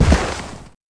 DROP

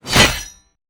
Swish Axe

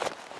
Idle leather small

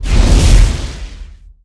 Magic

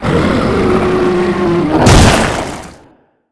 EarthQuake

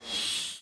missile icebolt

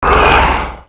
Attack Voice

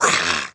DMG dragon

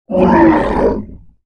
Death

